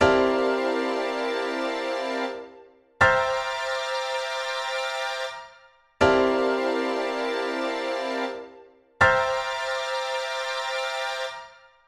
acorde treceava

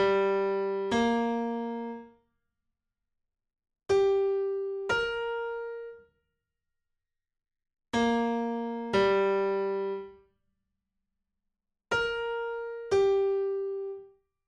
Intervalo tercera menor

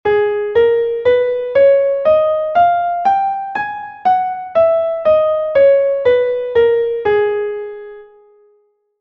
sol sostenido menor melodica

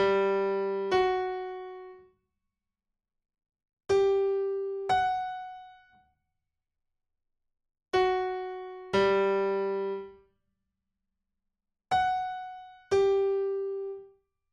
Intervalo septima mayor

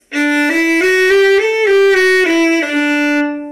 legato

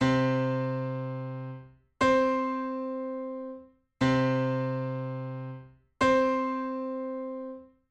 intervalo armonico octava justa

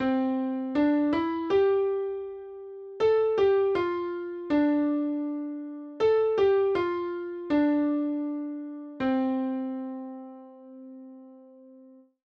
repeticion compas

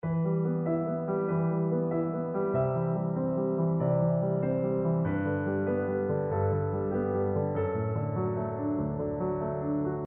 la menor 6 8

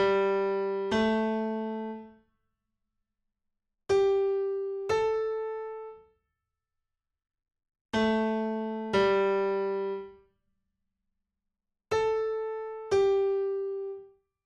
Intervalo segunda mayor

